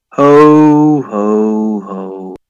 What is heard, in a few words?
bear christmas eat